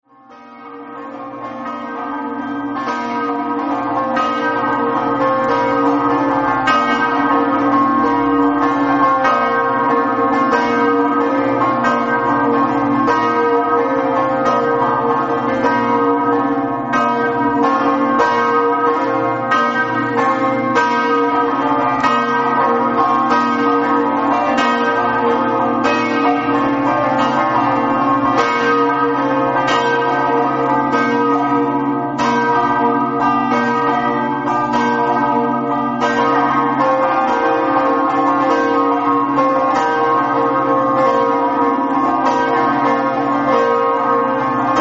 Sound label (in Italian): lestizza, campane